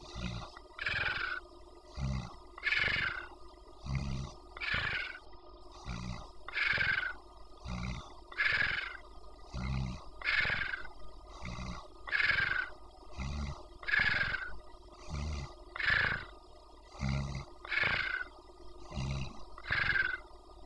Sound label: otter snores